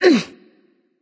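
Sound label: grunt attack, female; smite; voicepack; hera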